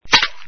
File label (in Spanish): TENIS, DEPORTES; Sonidos/deportes